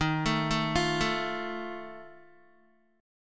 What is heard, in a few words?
Eb Suspended 2nd Flat 5th Eb Guitar 4F 4A 5C 5G 5C 6E; Ebsus2b5; arpeggiated